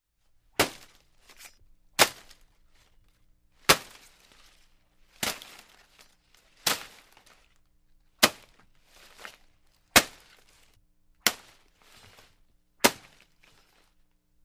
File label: Chop Eerie Leaves, Hits & Impacts